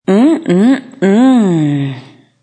Female MMM Moan, Female sighing; Groaning; Voice

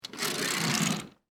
Household, Curtain, Close, Fast; Door, Wall; Miscellaneous Household Sound Effects

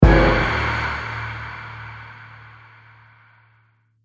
Trailer Hit, Trailer Sound Effects; Draculas Revenge

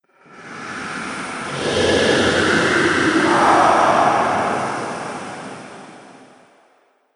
Pyramid Phantom, ghost sound effects

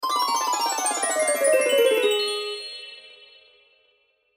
Harp Glock, Harp Glockenspiel Descend Ascend; Cartoon; Musical

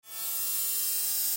Sci Fi Charge Up Short Power; Spacecraft; Blastwave

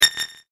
multimedia rollover, Multimedia Rollover Tone

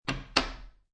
Playing Cards Tap Deck Of Cards On Wood Table; Lynne Music

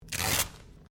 Sticky Tape Rip; common tape; Offices

